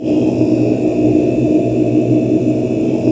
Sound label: TIBET, Gated FX; Optical Media International; Sonic Images Library; SI FX Vol 1